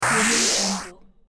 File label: retro 8bit video game warp sound; tony-parsons-music